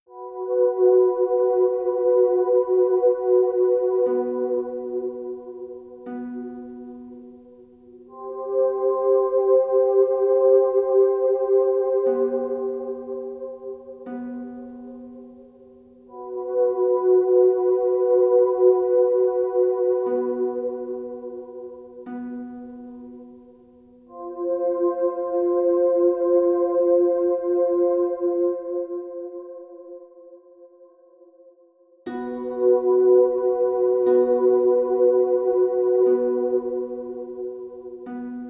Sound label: Harp